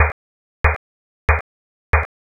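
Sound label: footsteps asphalt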